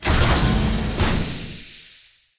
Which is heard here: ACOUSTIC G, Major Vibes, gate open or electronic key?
gate open